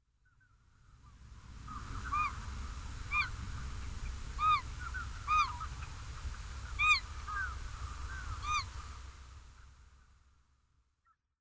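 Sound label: Lesser White-fronted Goose; カリガネ; 宮城県登米; 簗川堅治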